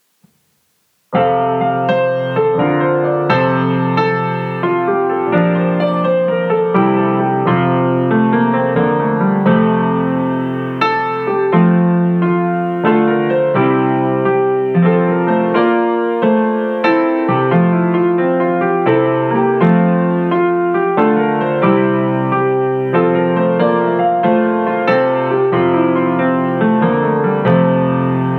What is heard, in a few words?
piano solo